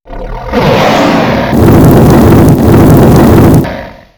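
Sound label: Dragon Attack Skill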